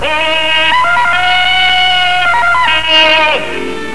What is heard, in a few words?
yell